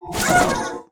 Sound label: RECRUIT CRITICAL; BARAS; Mob